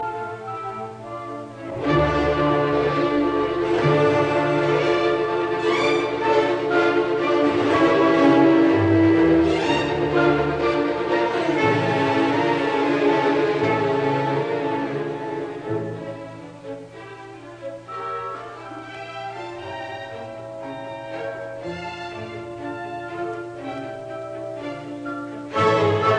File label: Piano Concerto in D minor Allegro; Mozart